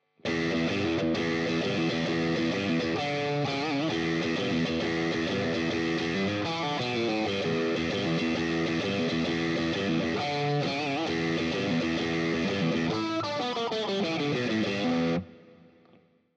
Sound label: Middle Bridge Drive, electric guitars; Gusta GST Standard; gst models